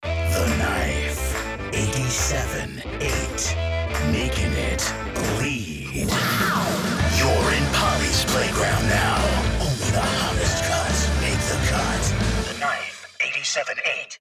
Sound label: (Jingle 2); The Knife 87.8